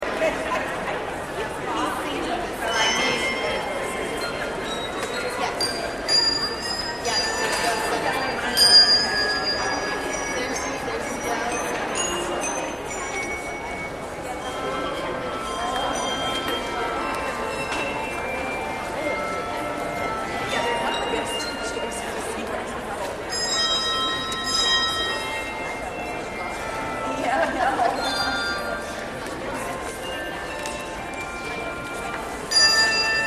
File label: Prelude, Carillons